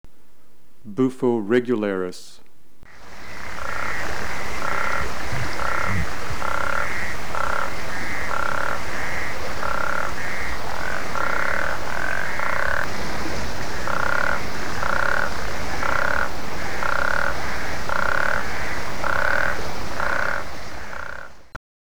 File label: Sclerophrys regularis